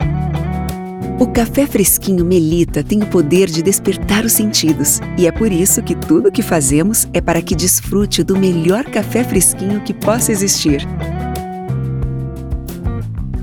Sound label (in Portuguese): Espera Telefonica; Melitta